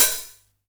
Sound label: 909 OHH Tape; Goldbaby Tape808 & Tape909; The Tape 909